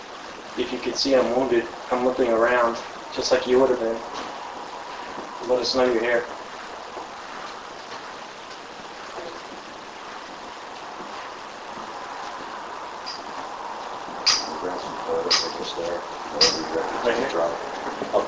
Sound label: 3 clanks and no response; Sachs Bridge Evidence; Spirit Chasers of the Unknown